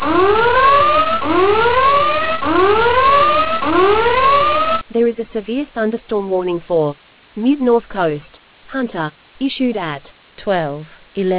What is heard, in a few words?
storm audio